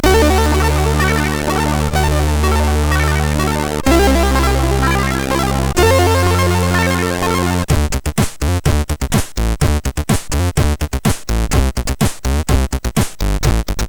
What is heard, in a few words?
Beat Bass, MOS6581R3; sid